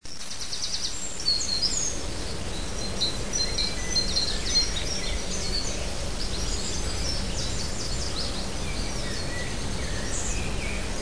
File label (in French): FORET